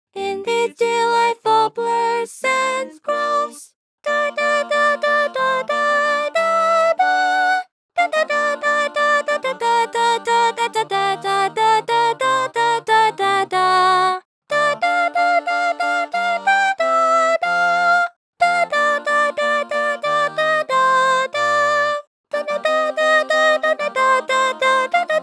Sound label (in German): sopran; In these delightful pleasant groves; chornacht 2026 uebungstracks